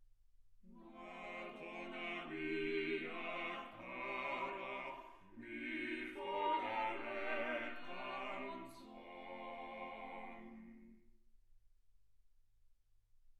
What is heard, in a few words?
quartet